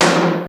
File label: KINGTOM HI